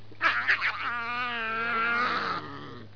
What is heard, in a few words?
snarl